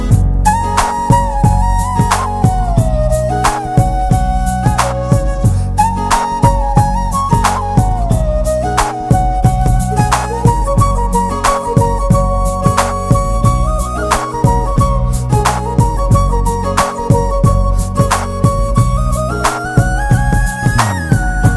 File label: BG Tone